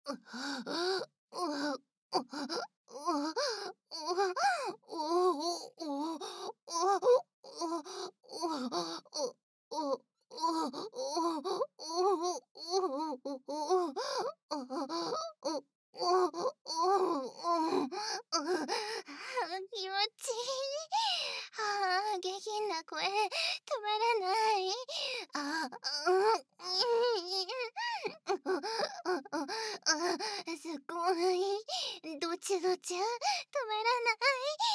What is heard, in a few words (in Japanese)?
騎乗位オホ声喘ぎ